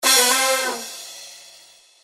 Goal; Rayman: The Dark Magician's Reign of Terror

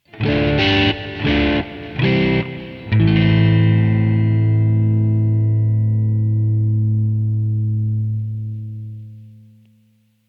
Lose; Project Reality BF2 1.6

